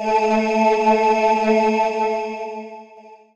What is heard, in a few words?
voi voice syn; Ueberschall; Techno Trance Essentials